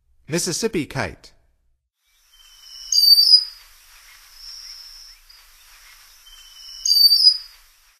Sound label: Mississippi Kite; North America; Stokes